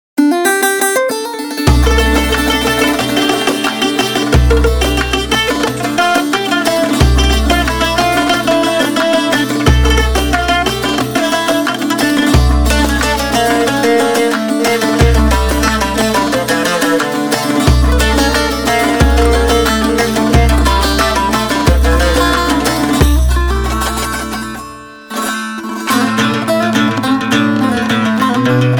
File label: middle east essentials rare strings